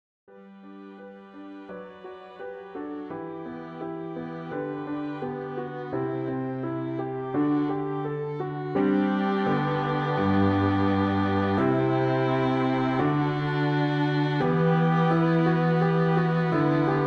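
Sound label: med accompaniment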